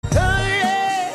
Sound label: Ooooh Yeaah